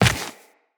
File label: Run Dirt, Footsteps; Characters; Tenno